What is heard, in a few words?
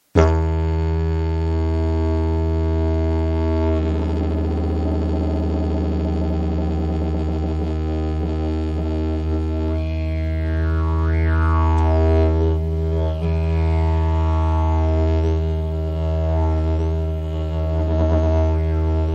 erle E1, didge